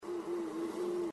bees buzzing sound effect very short

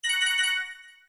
multimedia game star win gain slow; zapsplat

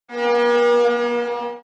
horn cruise ship

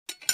knife on plate

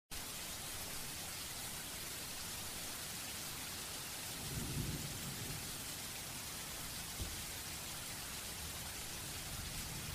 rain background soft

